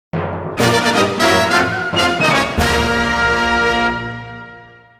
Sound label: Victory Theme; Ice Climbers